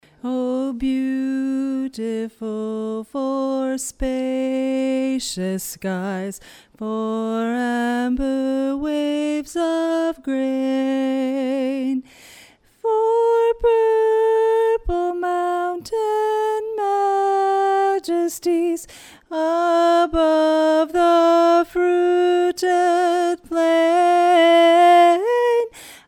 tenor alone, chorus

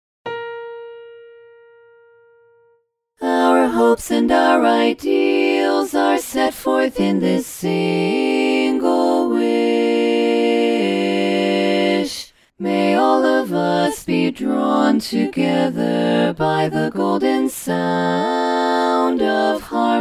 learning track four part

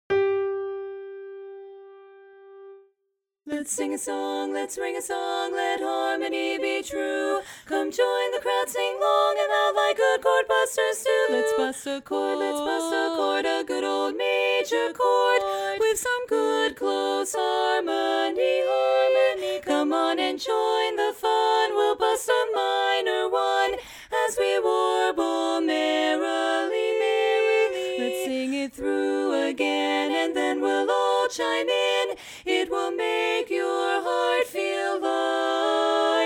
bass missing, chorus

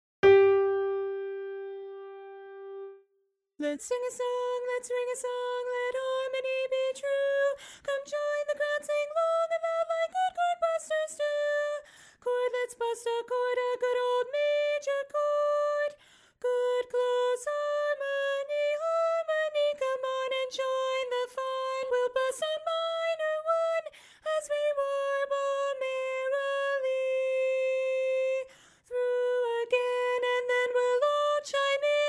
tenor alone

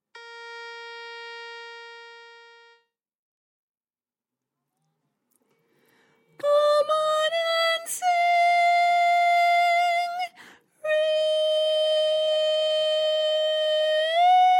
tenor track

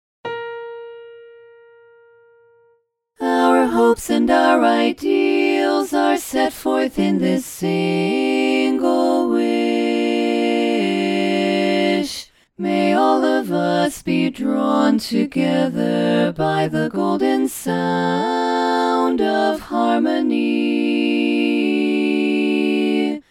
learning track tenor missing

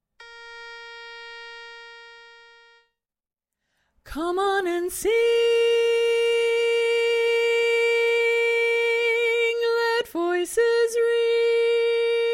lead track, chorus

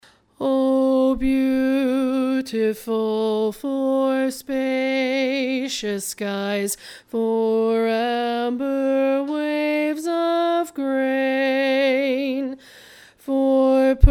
lead alone, chorus

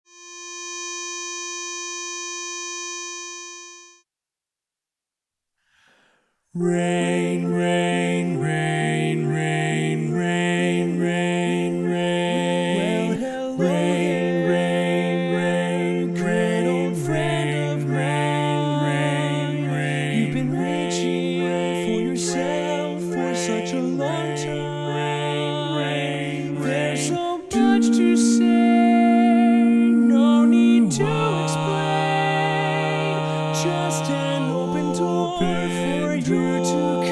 bass left, chorus

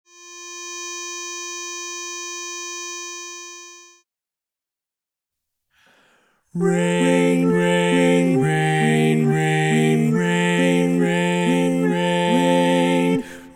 no lead, chorus

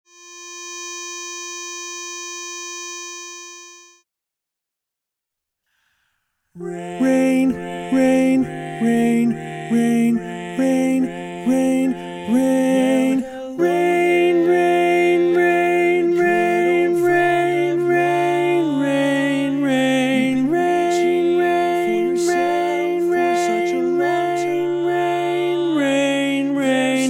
baritone predominant, chorus

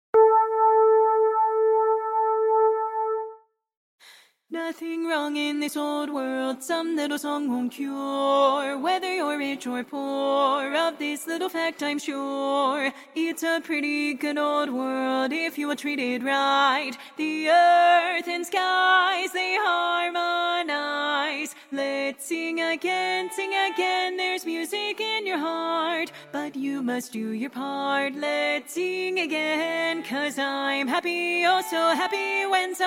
bari, chorus

